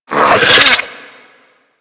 Infested Biped Alert; Warframe; Moa

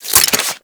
Whip Unequip; Grineer

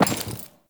Walk Metal, Footsteps; Characters; Enemy; Lady Deora

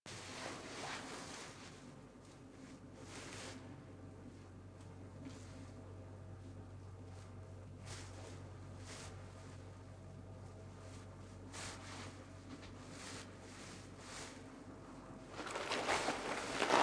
Blade Against Throat; Duviri; Teshin

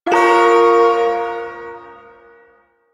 Ersatz Shawzin Hirajoshi Chord; Duviri

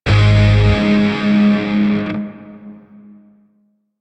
Shawzin Phrygian Chord K; Grineer; DE; Warframe

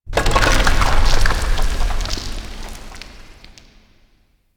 Asteroid Rock Movement; Ambience; Warframe; Grineer Asteroid

